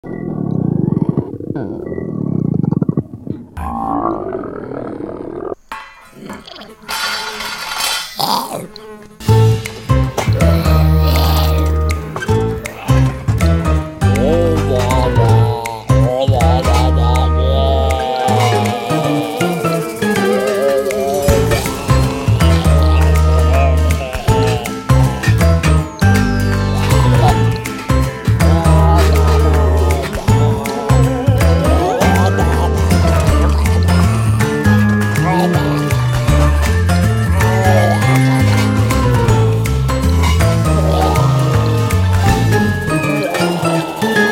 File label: Zombie Kitchen Background Music